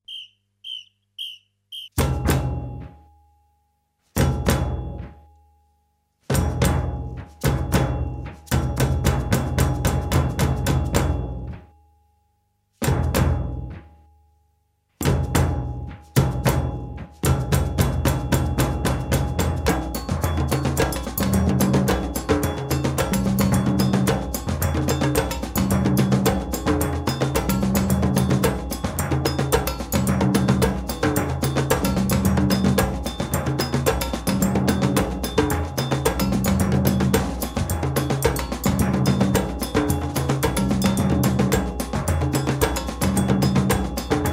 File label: groove